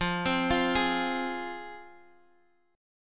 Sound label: F Minor, Guitar, Standard; arpeggiated; x,x,3,5,6,4; JGuitar